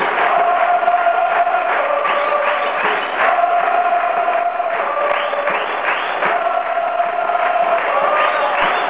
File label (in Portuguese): fogo